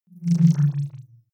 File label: wobble drop splutter low